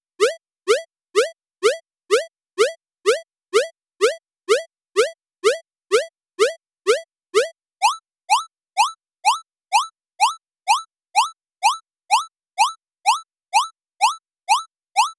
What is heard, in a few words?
8 Bit Offbeat, fx